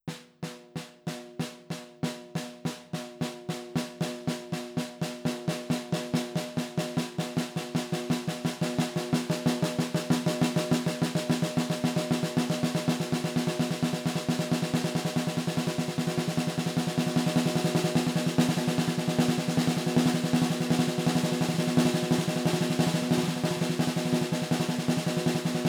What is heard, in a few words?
snare rolls